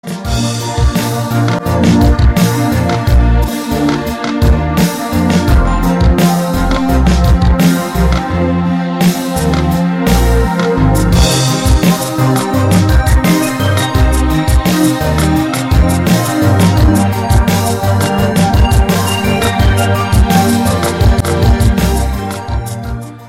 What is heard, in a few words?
Relaxing Chill Out, sonnerie